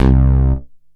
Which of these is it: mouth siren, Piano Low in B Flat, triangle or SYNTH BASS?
SYNTH BASS